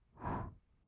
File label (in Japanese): ブレス 吐く音, "ほ"; ASMR; 1200 kawaii voice!!